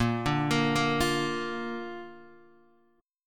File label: A sharp Major Guitar DADGAD